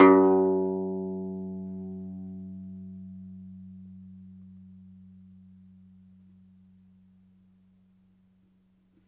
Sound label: DOMRA, Segundo Domra, Plucked Strings; E-MU Producer Series Vol. 7 – Old World Instruments